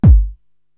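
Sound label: bass drum Distorted, Korg TR-Rack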